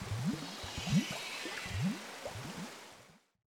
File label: idle, monster; elimental; lake